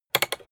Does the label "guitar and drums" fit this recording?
No